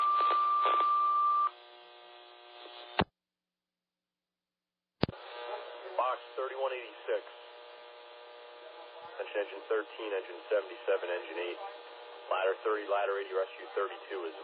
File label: alert page received; levittown